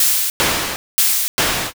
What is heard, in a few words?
breathing